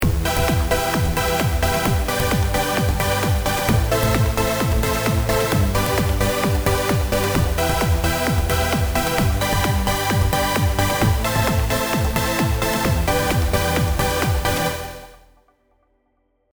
supersaw

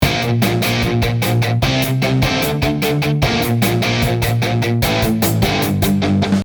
ノーマル Guitar

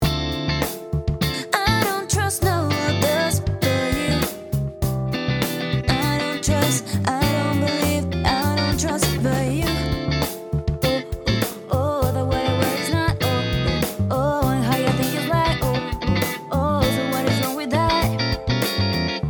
Variax Strat

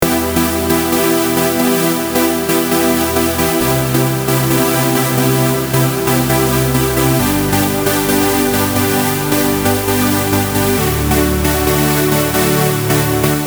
supersaw

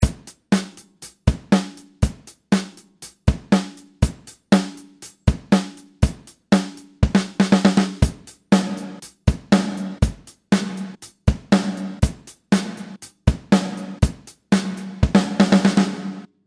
gate Snare on off

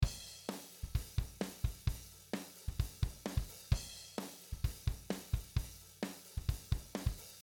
drum no cmp

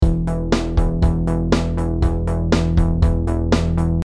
bass

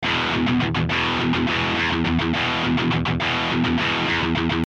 Guitar

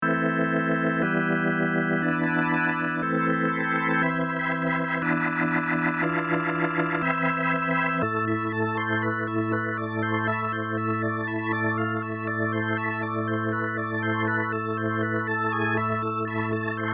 Xpand2 Full Bars

